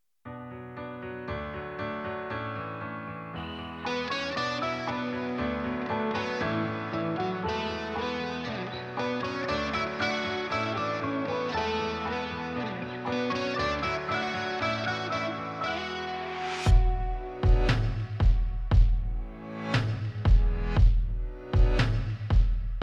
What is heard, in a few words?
Instrumental